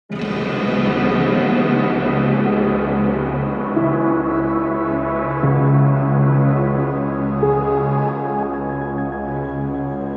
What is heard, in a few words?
music outro